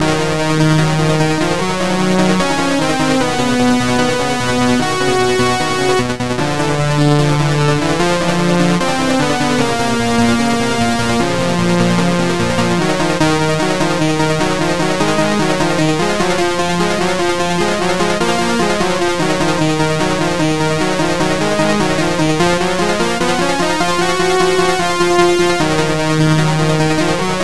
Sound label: Boss Music E